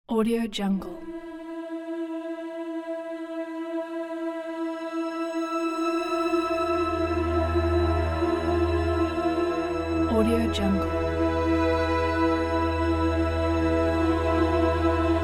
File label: dark fantasy ambience